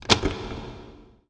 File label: drag on slot